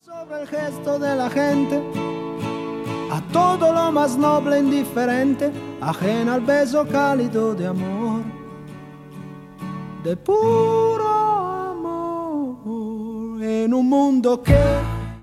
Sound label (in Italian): TV version mono